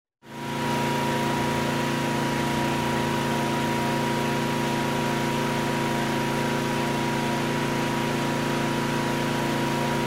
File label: traffic